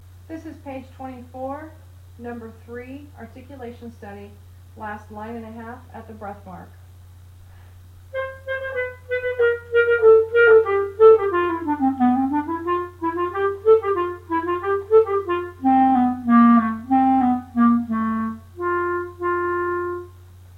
articulation study

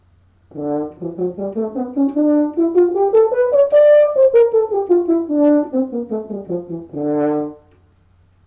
Horn a Mel min

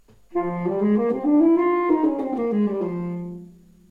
G Melodic Minor tenor

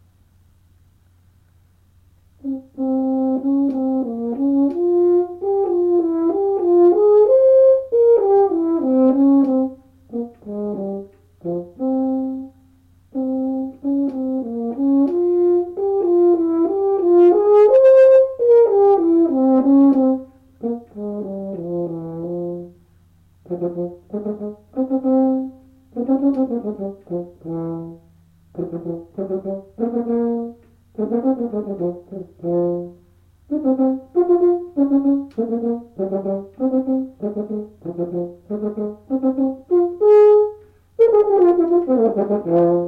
Horn

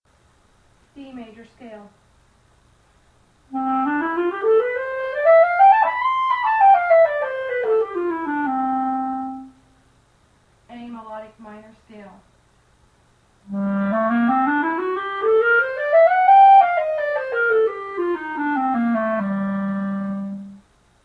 scales

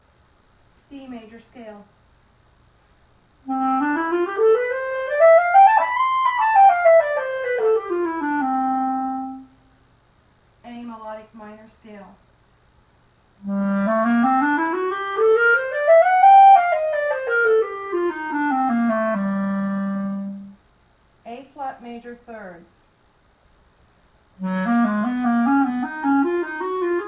Clarinet scales and thirds

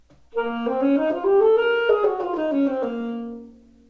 G Melodic Minor alto

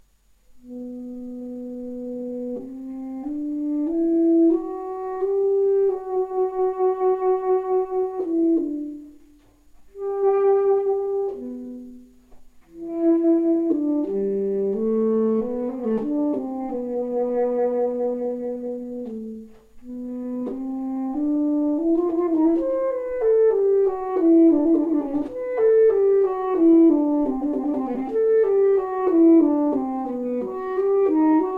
A Major tenor

